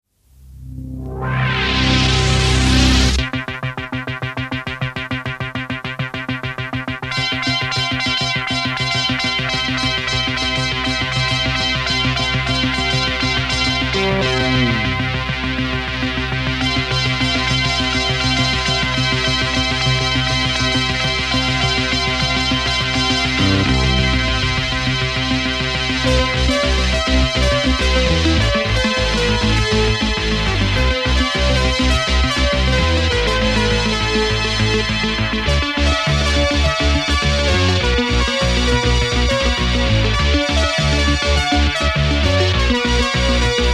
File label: Juno60